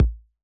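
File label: Bass Drum; Yamaha DJX-2B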